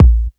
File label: rich lows kick drum single hit a sharp key